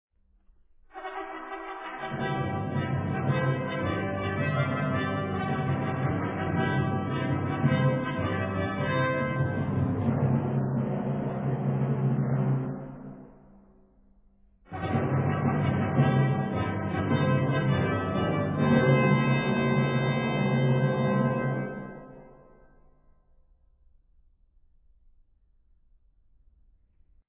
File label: fanfare